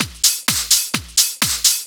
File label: Clubhouse Loop 128 BPM